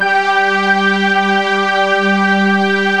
MLT TRK JX3P

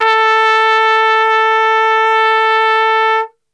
A, Brass; Best Service; Brass Super Section; TRP STRGHT

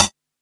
Quasimidi 309, Drums

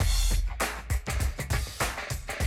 drum loop, 100 BPM

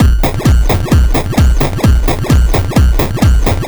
Crushed Beat 130BPM; musicradar

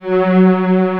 ST SA, SYNTHESIZER ANA STRINGS

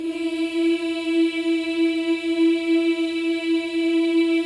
BOYS EES; Symphony of Voices

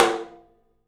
OPEN TOM; 3D Sound; 3D Drums

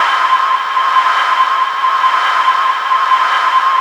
WINDY CITY; Spectrasonic Distorted Reality 1

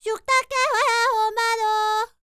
Vocal Planet World Voices, NOMADIC; ILIO; Vocal Planet VOL-5

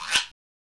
PRC GUIRO

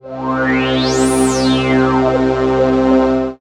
PAD, Dance Mega Synth

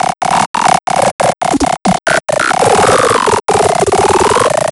bug seq; Big Beat Samples & More; AKAI; ESO SEQS KIT

